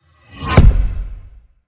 Batting Swipe Hit

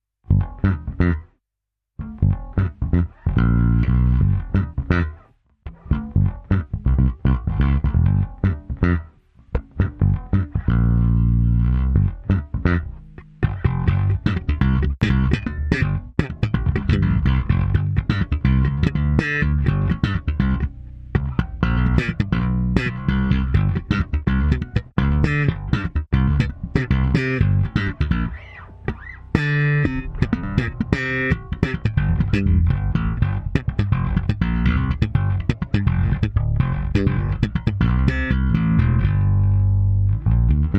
Solo Bass Groove

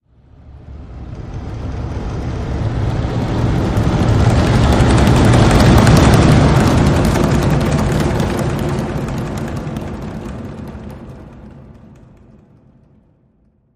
Stadium Rumble Transition

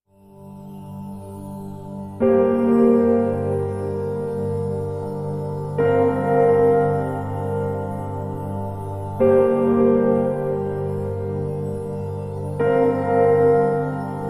Piano and Drone Mix

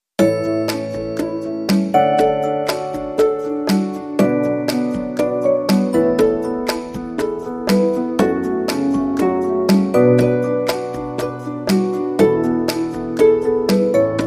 Percussion and Keyboard

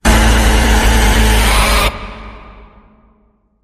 Not Human Goblin Bend

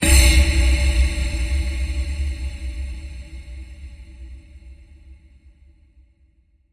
Echoes Hollow Breath Ping

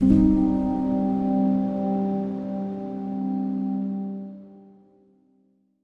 Link Sting

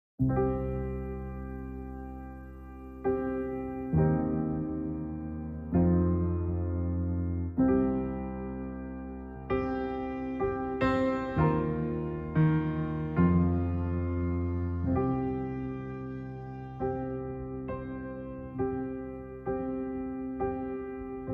Piano and Bells

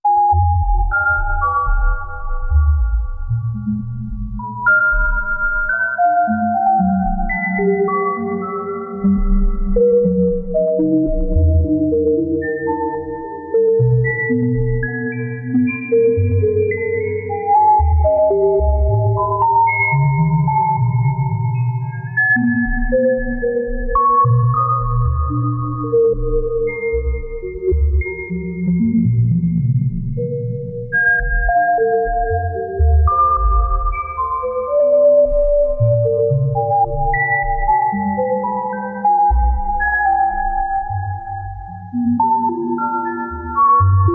Ambient

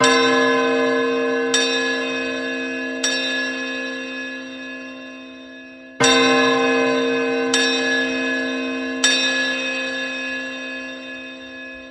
Christmas Morning Church Bells

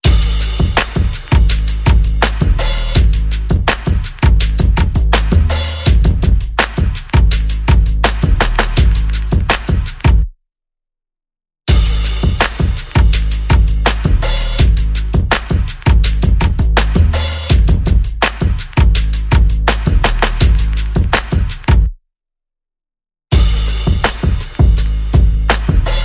Percussion and Bass

